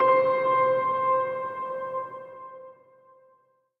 Monolith Melody; no man's sky

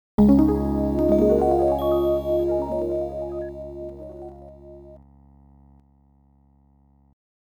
Game Start; Touch Solitaire

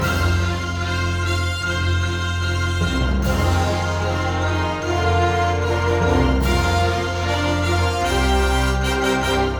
Hurry!; Tossed in Deep Space; Toy Story Mania

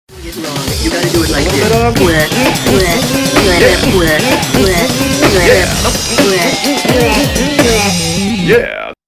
DEAD HEAT BEATBOX; DJ Professor K Presents 24/7 Funky Fresh Beats From Tokyo to 2020

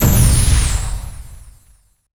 Spell Explode

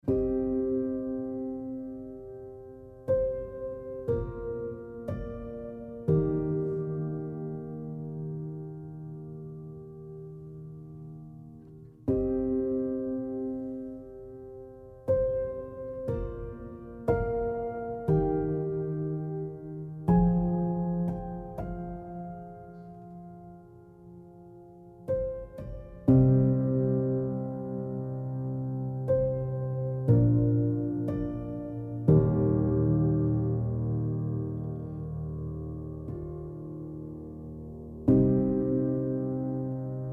Exploration General Piano Fragments

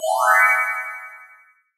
Canvas Flip Picture Appear; Tales of Sorrow Strawsbrough Town; Puzzles

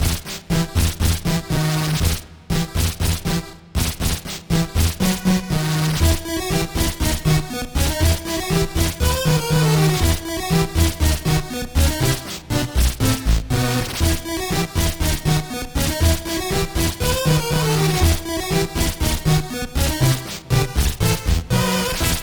music kingdom barracks loop; Skellboy

